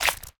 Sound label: step; block; peelgrass block